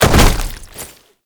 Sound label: Land Heavy Flesh; Movement; Warframe; Uriel